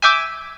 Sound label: CHORD, Eclectic EPs; Hip Hop; E-MU Formula 4000 Series Vol. 1; Hip Hop Nation